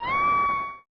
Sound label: "Ahhhh!"; super mario excitebike